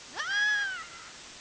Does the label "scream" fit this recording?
Yes